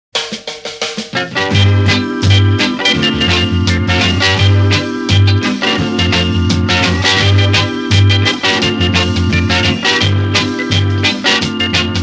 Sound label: Heartbeat, Reggae instru